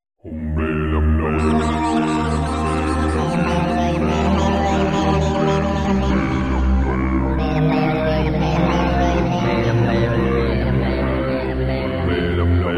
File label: Mumblers, Human Synths; Spectrasonics Vocal Planet